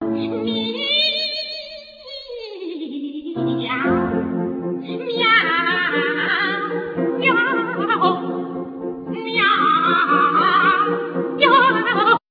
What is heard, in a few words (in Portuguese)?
dueto